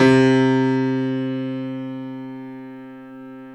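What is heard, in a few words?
STEIN C2, Steinway grand; Greytsounds VOL-1; STEINWAY GRD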